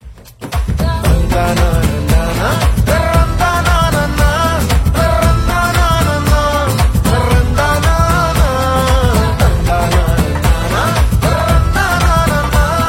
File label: BGM Humming Ringtone; from Peddi Movie